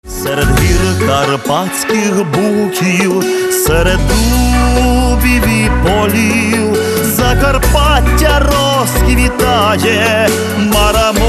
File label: Karpatskyi Chardash